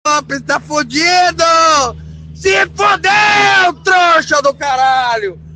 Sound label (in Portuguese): risadas